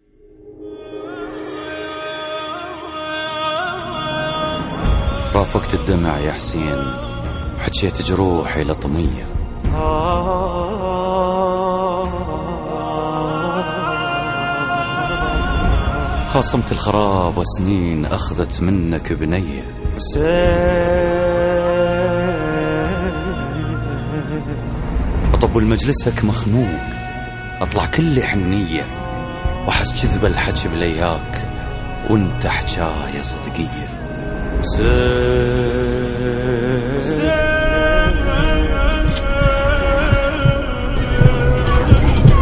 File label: nawha arabic